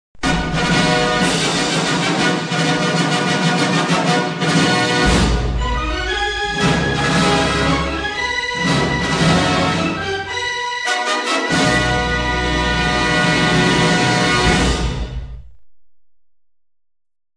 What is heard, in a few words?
jingle fox; foxlife